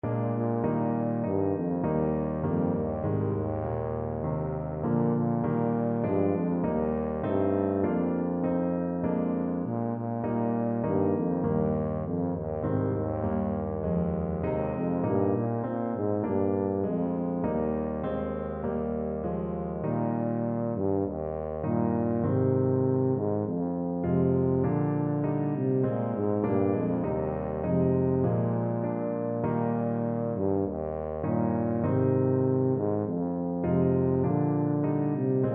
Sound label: sing hosanna, tuba